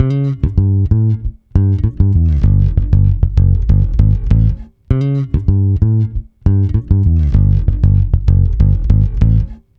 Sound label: Bass C# Funk